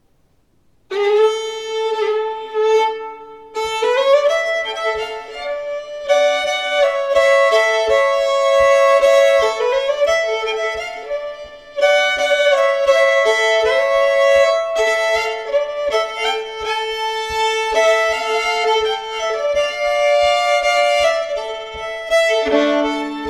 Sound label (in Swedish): Sarna gamla brudmarsch